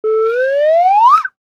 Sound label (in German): Lotosfloete up